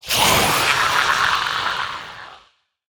Darkspawn Death

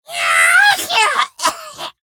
Dust Bunny Attack

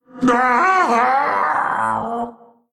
Male Qiraji Death

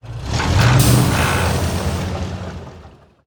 Ironclad Attack

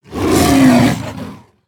Cat In A Hat Death

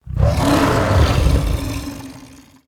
Felsaber Death

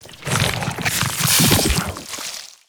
Corrupted Egg Enter Play

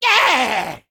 Male Imp Death

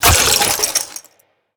Building Block Golem Death

